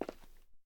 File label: footstep; gmtk24